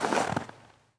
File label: snow step; Golden Crayon Sounds